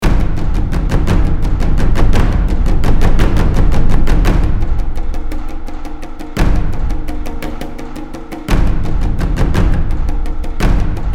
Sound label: katsumoto, Modern Percussion; Audio Ollie LA Modern Percussion 1.1 KONTAKT